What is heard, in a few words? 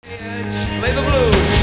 program error; BigMax nº 7